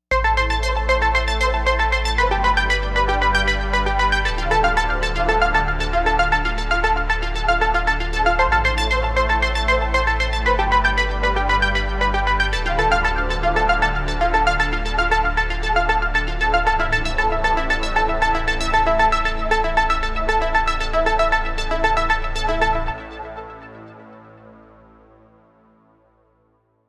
arpeggio; jupiter-80 quando

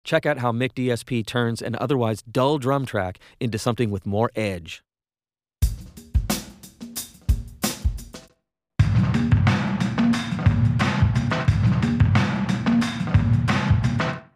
vs8f 3 chrome tone drums

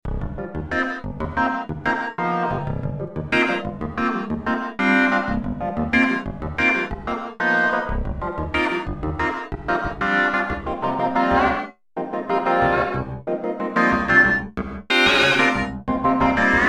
vc 1 funky bed trax

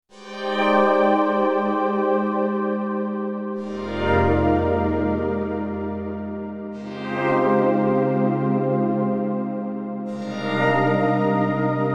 soundtrack

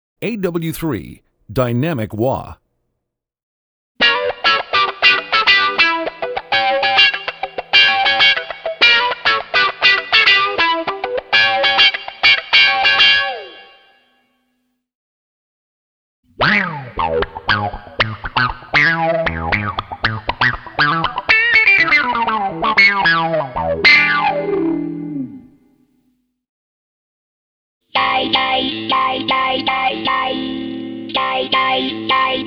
tone; aw 3 tone demo

